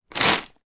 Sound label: food bag of frozen vegetables pick up from table